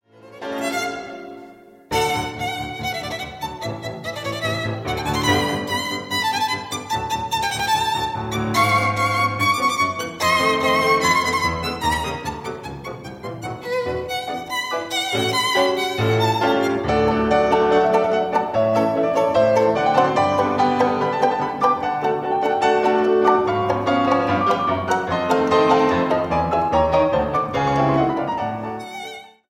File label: Sonata for Violin and Piano Allegro con brio; Pancho Vladigerov; op.1